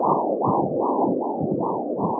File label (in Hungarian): Fehérzaj; relax compact hang es dallamterapias feher zaj keszulek